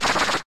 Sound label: Petal Blizzard